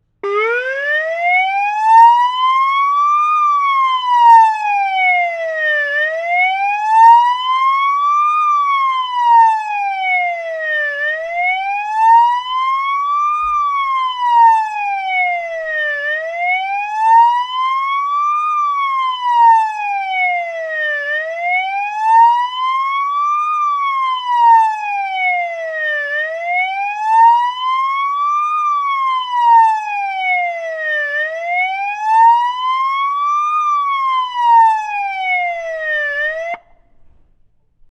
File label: Police car siren